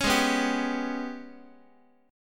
G sharp Minor Major 7th Double Flat 5th, Guitar

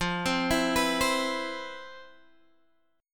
C Major 7th, F, guitar chord; Cmaj7/F; arpeggiated

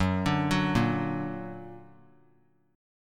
F sharp Augmented; arpeggiated; 3C,3F,3A,3D; JGuitar

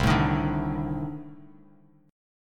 C Minor 6th Add 9th C Bass Standard; Cm6add9 strummed; JGuitar

